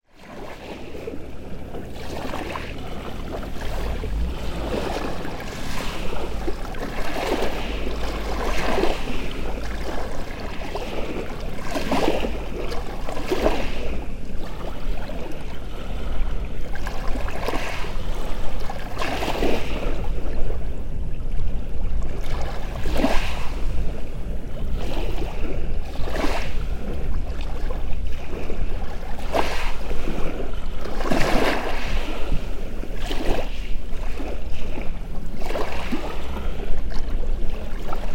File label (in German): Baikalsee